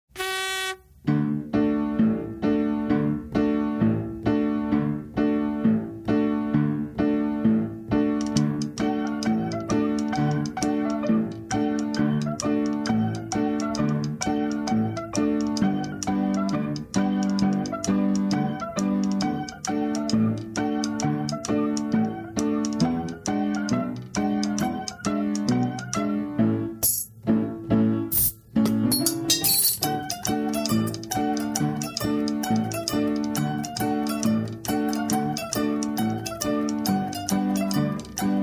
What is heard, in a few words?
sfx